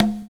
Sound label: Conga; Equipped Music; Loungin House